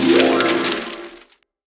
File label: gift smash; sfx